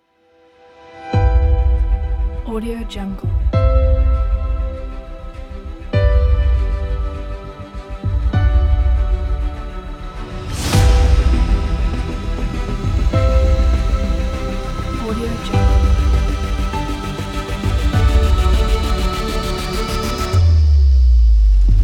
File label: epic